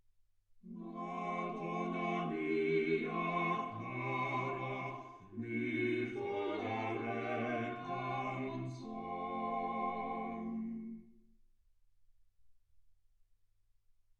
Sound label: quartet